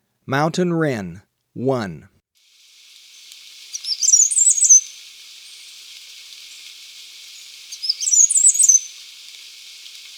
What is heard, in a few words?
Mountain Wren; S. America